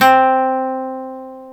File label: GTR Nylon, Roland